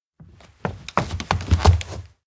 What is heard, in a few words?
jog; rikka; anemoi パッケージ版 1.1